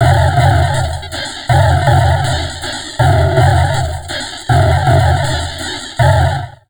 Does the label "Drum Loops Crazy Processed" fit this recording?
Yes